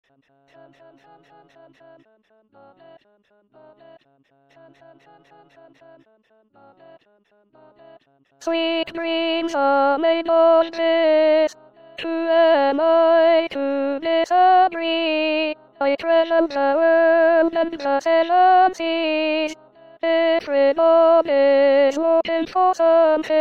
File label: alto